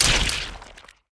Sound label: ATTACK; Eft; Drakefly